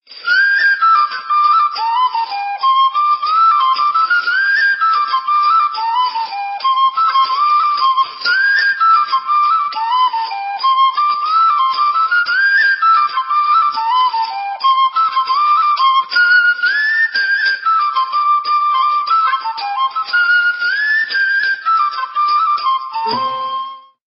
irish flute; classical

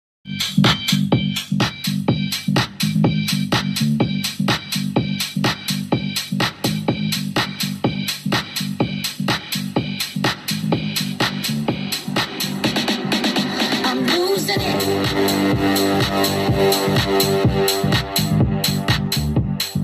Trap

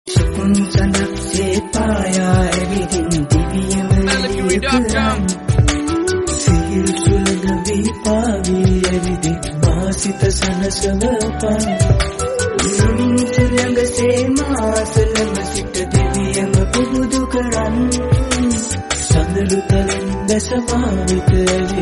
sample ring

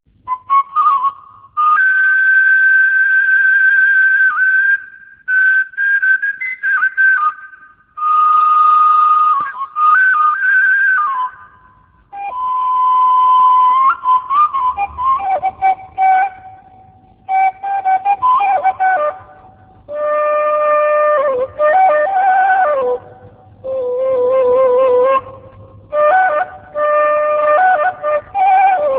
suling; classical

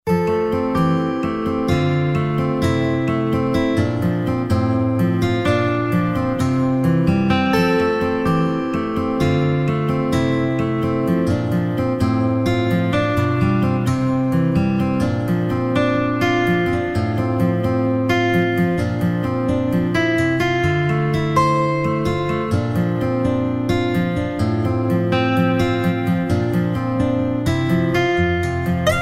Relax guitar

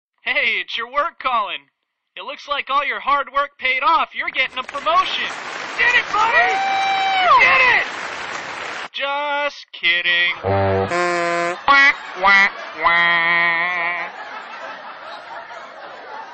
ring ringtone

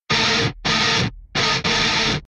intro riff

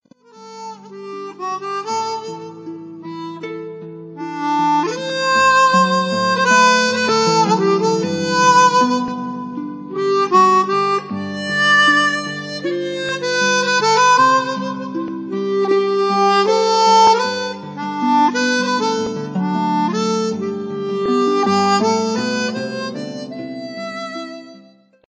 Harmonica

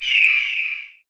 screech; ssbm

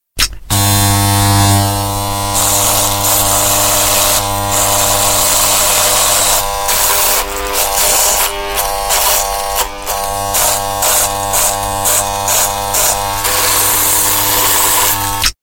Shaving Sms

